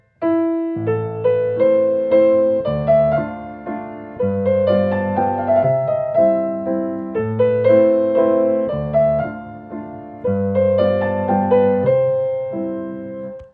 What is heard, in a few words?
Waltz in A minor; Chopin